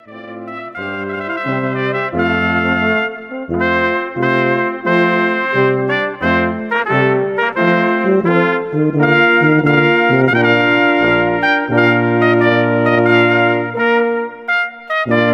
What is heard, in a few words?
Trumpet Tune, brass; Legend Brass